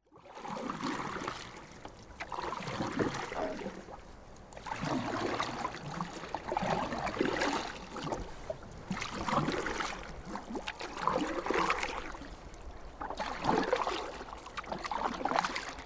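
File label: rowing with oars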